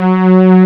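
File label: G3, SYNTHESIZER, analog STRINGS; AKAI S6000 CD-ROM - Volume 1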